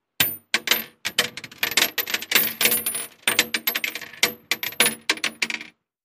Shell Case Mult Steel, shell casing movement

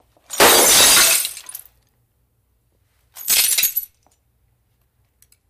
Glass Shatter Tinkl; shards

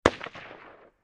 Ak47 Machine Gun Sing

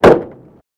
Cessna Door Close

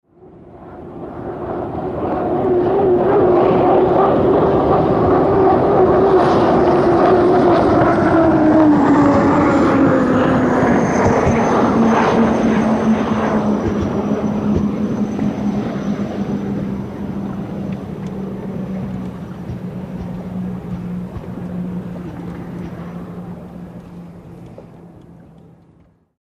Hydroplane By Ambie

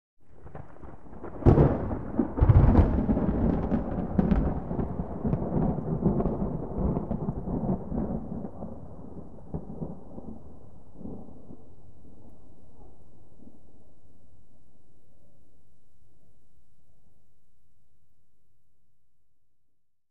Thunder Medium Distant

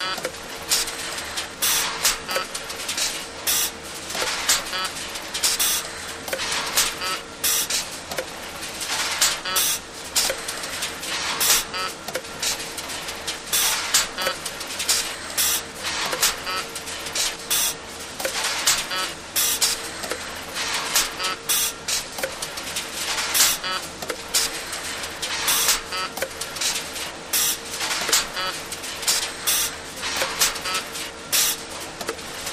Funny Factory Beep; machines

